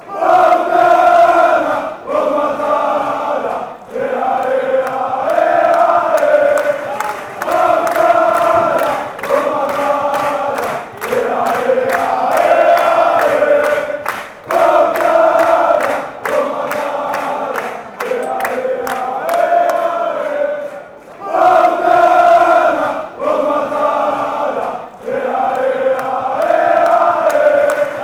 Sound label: paok fc chant